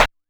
CLAP, PERCUSSION; AKAI S6000 CD-ROM - Volume 1

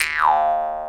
Jaw Harp Eo; Multisamples; Digital Sound Factory Planet Earth

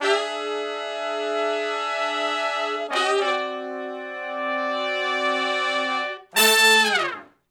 Slo Blues Chords (V, IV, I) (A) w falloff; Sections; Crimson Blue and Fabulous Horncraft 4 RnB; Horncraft for R&B

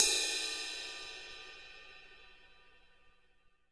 CRASH 1 TIP, Drumscores; 90s sample CD

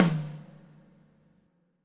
FX TOM, Drums Acoustic; ALL TOMS; USB Soundscan vol.10; AKAI; 90s sample CD

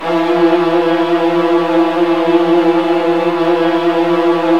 VA TRILLS, Strings; ILIO; Kirk Hunter Virtuoso Strings VOL-2

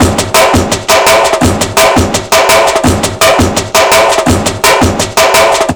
BREAK, Explosive Jungle; USB Soundscan vol.05; AKAI; 90s sample CD

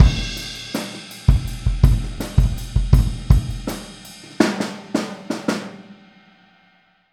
Boogaloo - Outro - Kick, 82 BPM; Sonic Foundry; Drums from the Big Room The Mixes

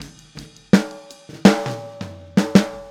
Boogaloo Fill Snare, 82 BPM; Sonic Foundry; Drums from the Big Room The Mixes

